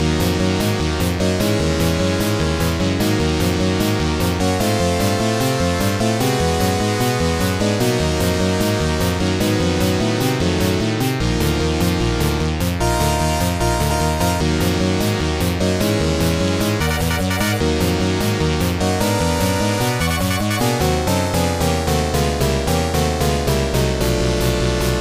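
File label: background music; Avengers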